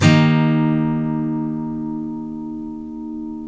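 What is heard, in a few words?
steel D# major up, CHORDS STEEL, Guitars; Spectrasonics; Hans Zimmer Guitars VOL-1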